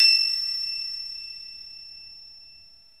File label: LINEAR SYNTH